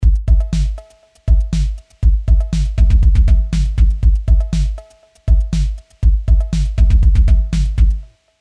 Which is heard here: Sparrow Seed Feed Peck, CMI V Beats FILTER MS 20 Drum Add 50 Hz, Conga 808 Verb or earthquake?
CMI V Beats FILTER MS 20 Drum Add 50 Hz